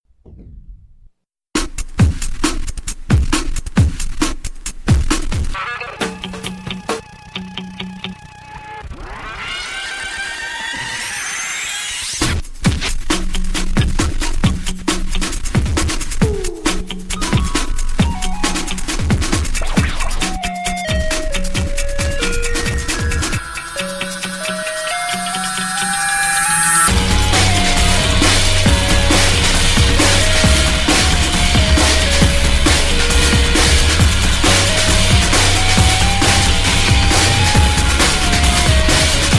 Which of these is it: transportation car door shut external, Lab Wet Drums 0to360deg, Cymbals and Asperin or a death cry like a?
Cymbals and Asperin